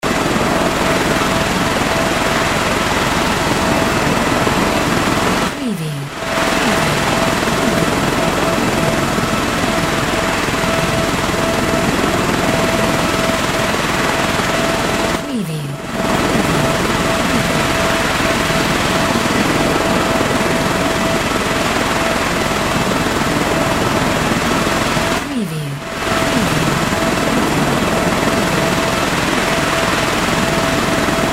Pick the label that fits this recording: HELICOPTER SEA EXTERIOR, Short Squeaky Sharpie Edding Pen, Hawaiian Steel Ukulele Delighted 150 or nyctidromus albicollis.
HELICOPTER SEA EXTERIOR